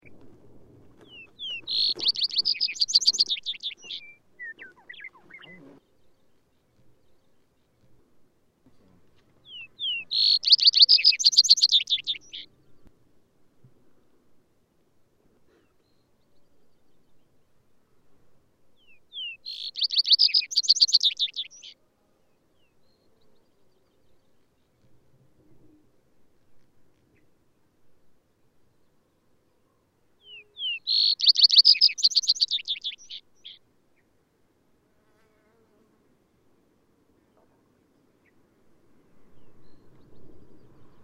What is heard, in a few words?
Vesper Sparrow